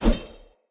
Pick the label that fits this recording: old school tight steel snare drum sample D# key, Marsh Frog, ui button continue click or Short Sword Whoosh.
Short Sword Whoosh